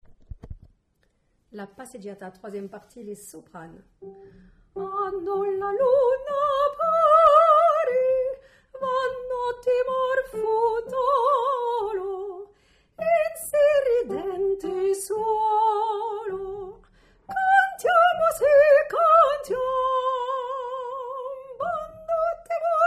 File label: Soprano